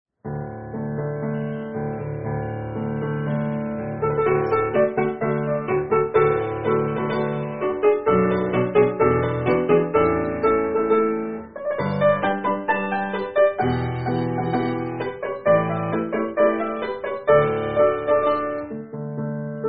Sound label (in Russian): фортепиано; Г. Григ; Свадебный день в Трольхаугене